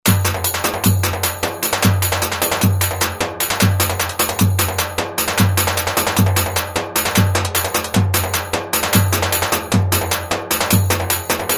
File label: 9 8 techno